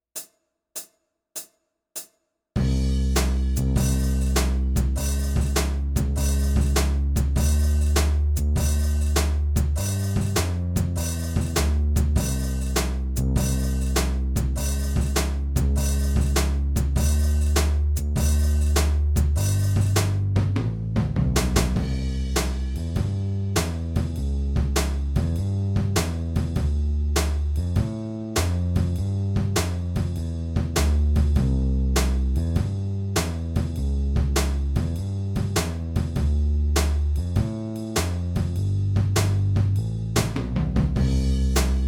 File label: Extended Harmony Chords